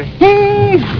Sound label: gung noise; gunga